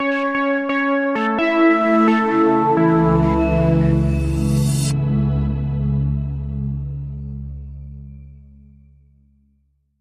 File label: Sonic Light